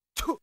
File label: punch